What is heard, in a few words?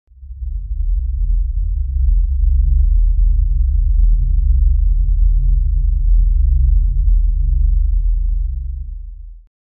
cricket stadium echoing w